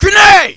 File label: grenade; hgrunt